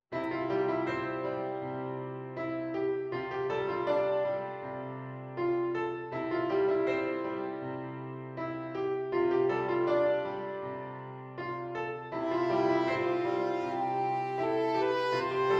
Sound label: SAX; alto tenor or baritone saxophone